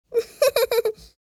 Boy child laugh chuckle

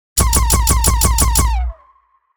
Blaster futuristic weapon rapid fire mode

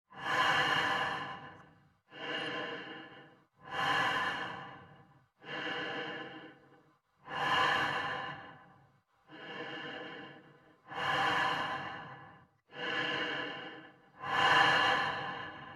Gas mask normal breathing; military equipment sound effects

